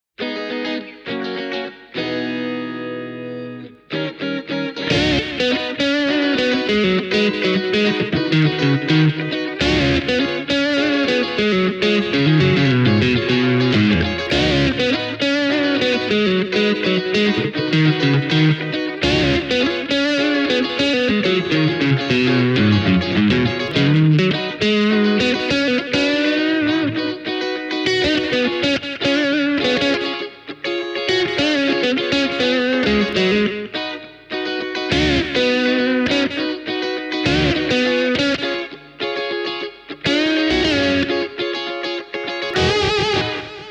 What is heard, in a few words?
esp ltd mm 30 guitars only